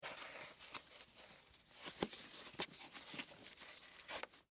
Tennis Shoes Tie, Foley, Tennis, Shoes, Tie, Laces; BlastWave